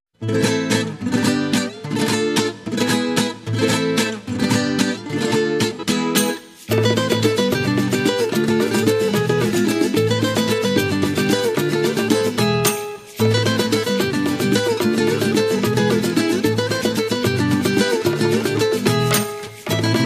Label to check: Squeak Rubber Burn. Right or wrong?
Wrong